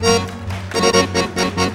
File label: ACC BRK, ACC BREAKS; Best Service; World Colours